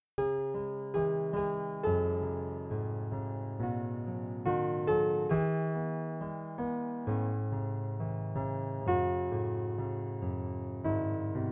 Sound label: Piano